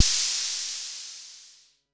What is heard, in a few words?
FX; MPC DSM-2